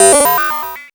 MOOG HAT; AMG - Vince Clarke Lucky Bastard

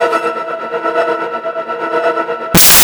SUPER TREM; Spectrasonic Distorted Reality 1

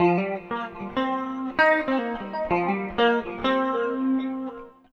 GUIT; East West - Phat & Phunky

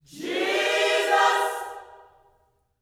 JESUS, Gospel; Spectrasonics; Vocal Planet; GOSP CHORD

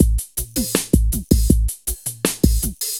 LOOP, Eurotech; Sounds Good

